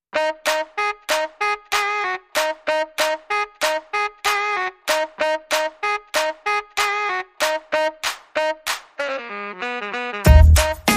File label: No Vocals